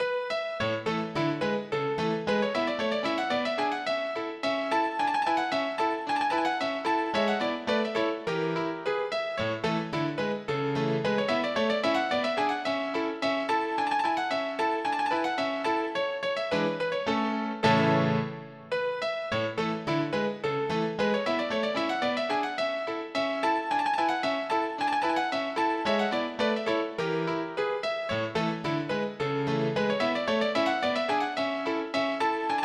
GAVOTTE

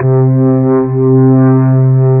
B3 Dist Slow C4; SoundFont - The Developer's Choice - Volume 1

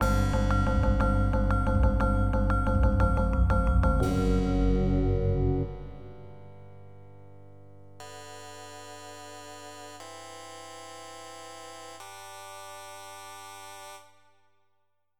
mission; Flashback Demo

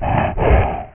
BREATH; PowerMonger

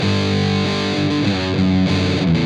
Padding, Guitars; ACID Music

